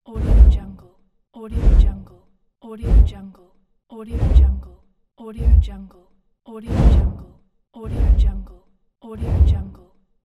whooshes

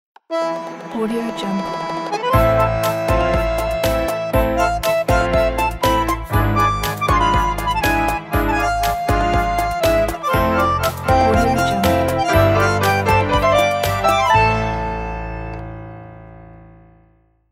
passion tango logo; eugene kazantsev

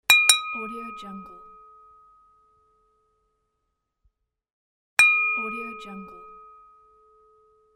ship bell

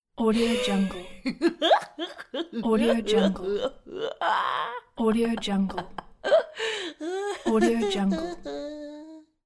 funny cartoon laugh

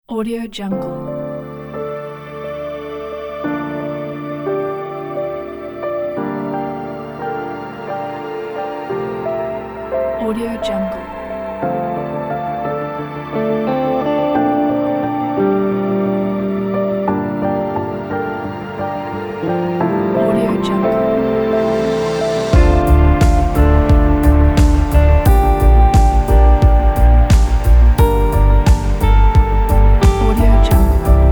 cinematic inspiration

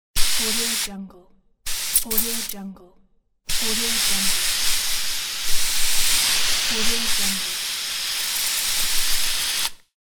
aerosol spray

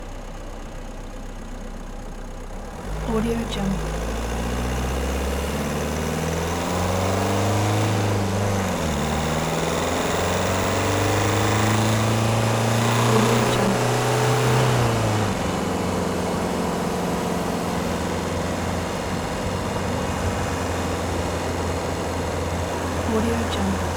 car driving short engine

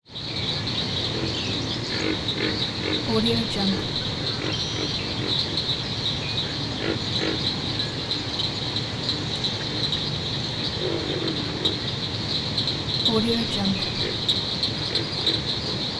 crickets frogs swamp forest

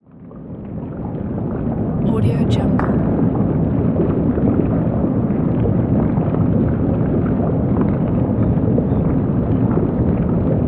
underwater

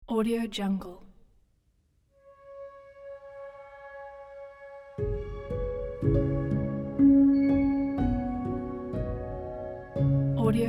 contemplative soundtrack

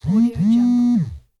phone message vibrate

